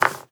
dice pick up

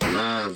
kill; hippy; project-hawk

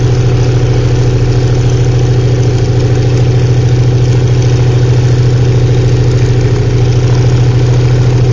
default pistonprop lopower; rigs of rods

